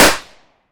confetti; gmod; arccw uc commons attachments

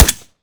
fire sup; gmod; arccw uc commons attachments

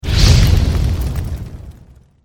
nexu growl; valkyrie npc pack; fallenlogic

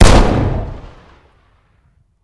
fire dist int pistol heavy; gmod; arccw uc commons attachments

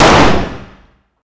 fire dist 9x19 pistol int; gmod; arccw uc commons attachments

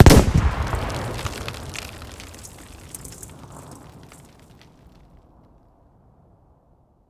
close, explosions; gmod; lvs framework

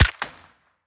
fire, arccw sound; 22 sup; gmod; arccw uc commons attachments

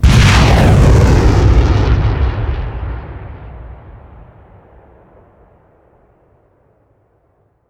trail, rocket; gmod; arccw uc commons attachments

